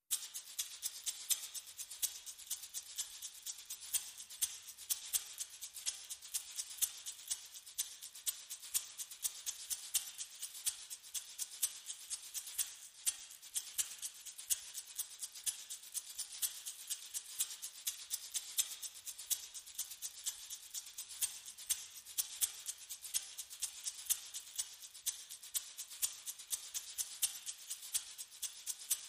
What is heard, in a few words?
Shaker & Tamb